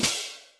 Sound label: Cymbal, Yamaha DTXpress; drum machine